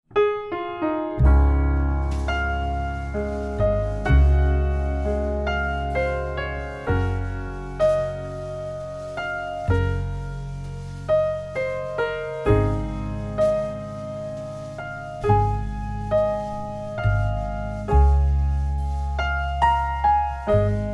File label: Classic In Store Radio Background Music